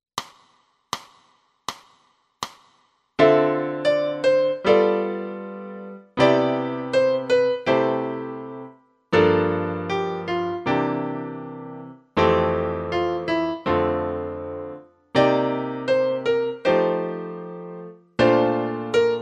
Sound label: piano